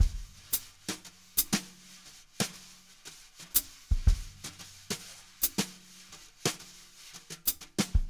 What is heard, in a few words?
jazz brush loops